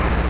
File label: explosion